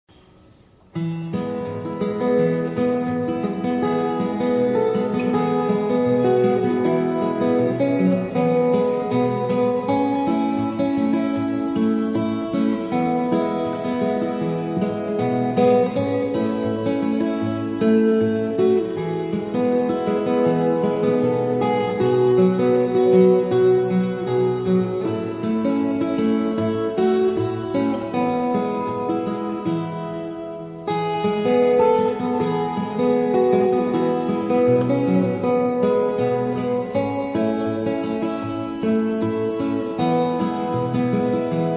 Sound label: colours fingerstyle